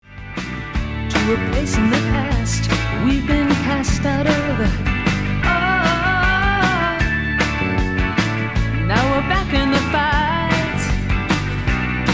chain gang

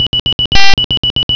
bonus

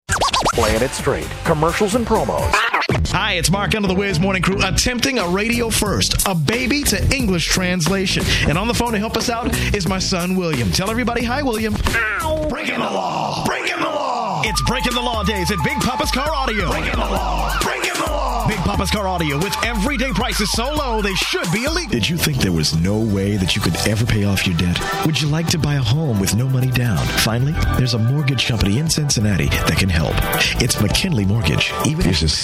straight vox

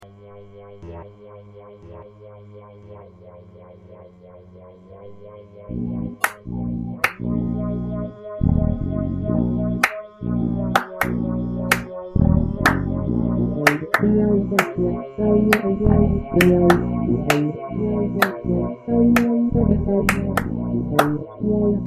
sing

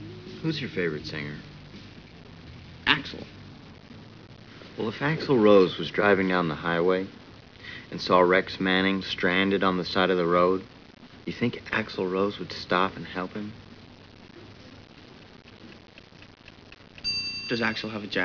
water

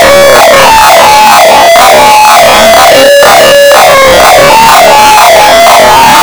alien theme

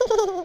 hip giggle

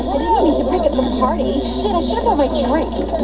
drink

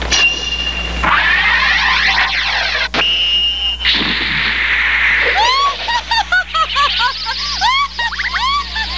laughing; Ranma

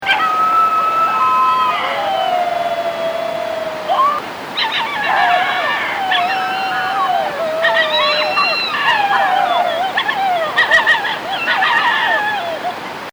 dingo

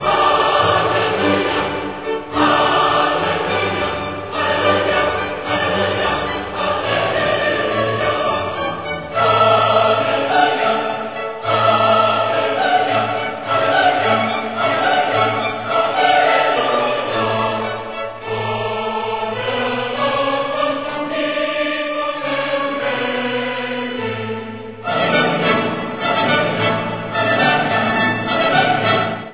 Hallelujah

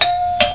bell

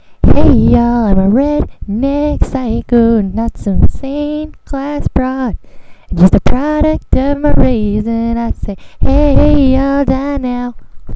bad song

